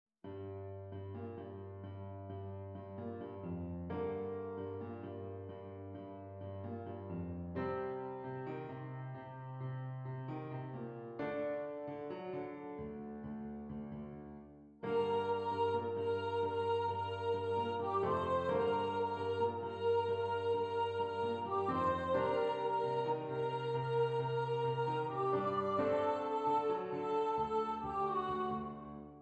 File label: Piano Vocal